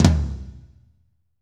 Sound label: tom, Pop Country; Northstar; Drumscapes Roland